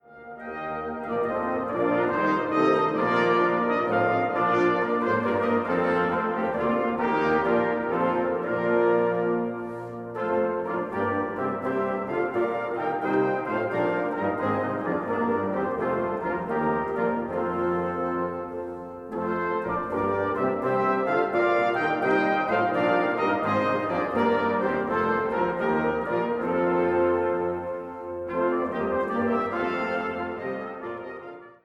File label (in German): klangerlebnis orgel